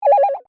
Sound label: Communication Ping, Scifi, Communication, Ping, Science, Fiction; Communications